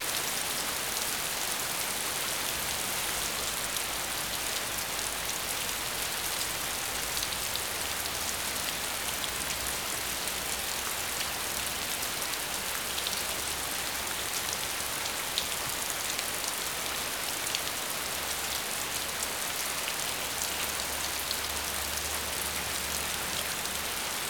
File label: Rain Heavy